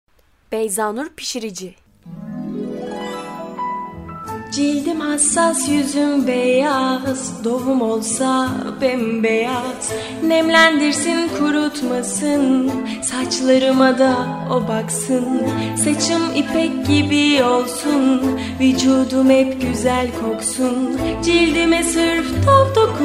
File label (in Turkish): vokal